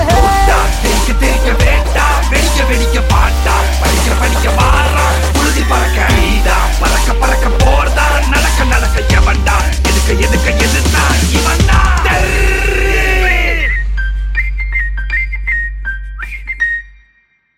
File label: Dub Step; Theri